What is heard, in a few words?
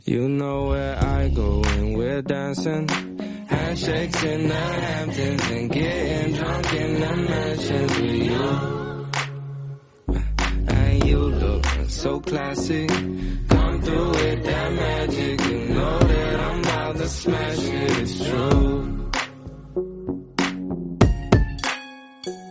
lovely song tone ringtone